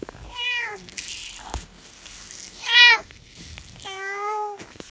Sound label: a cat combing his hair; domestic